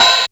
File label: Casio SA-10 Ohh